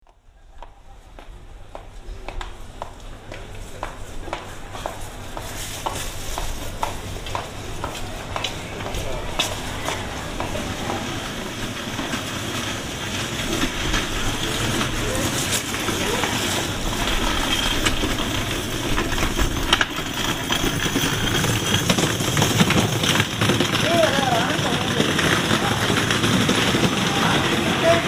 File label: koenji walking, field recordings